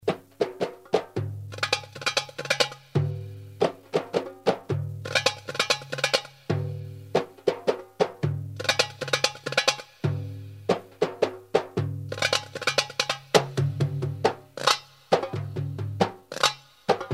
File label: Drum Solo